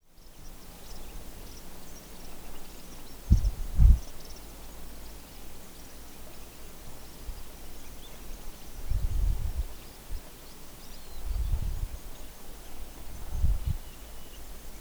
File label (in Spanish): AMBIENTE CASTILLO; CASTILLO DE MAGACELA